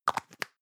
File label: plastic can lid screw close hard long; objekte; dose